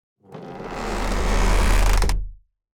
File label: Designed cinematic wood textures forced bending stress